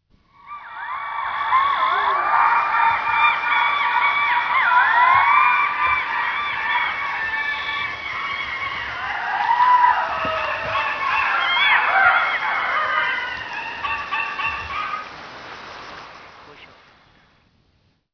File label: Jackal Calling, Sensory Story; Egypt